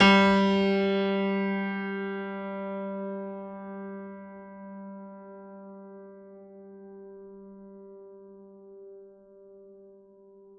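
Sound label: Classical Piano G2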